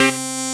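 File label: synth C, BUZZ SYNER, House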